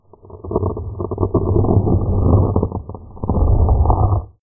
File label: Opening Stone Grave